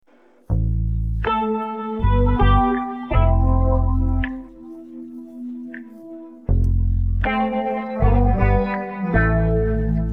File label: no vocals